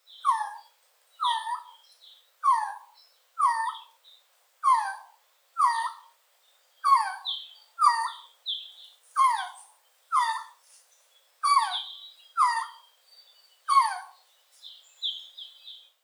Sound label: Resplendent quetzal